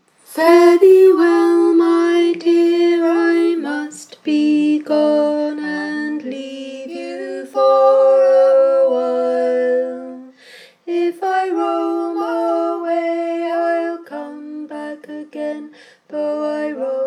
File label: Harmony with melody; The Turtle Dove